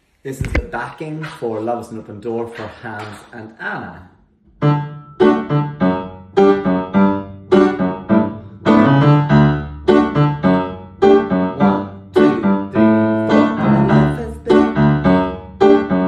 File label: Backing; Anna; Disney's Frozen